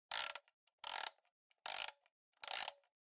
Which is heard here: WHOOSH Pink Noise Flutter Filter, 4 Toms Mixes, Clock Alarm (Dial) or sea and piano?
Clock Alarm (Dial)